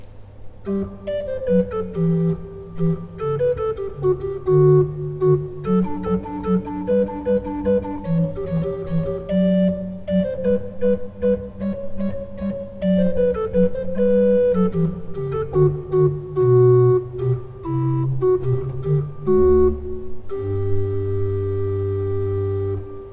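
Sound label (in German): Tzschoeckel 5 I, pfeifenorgeln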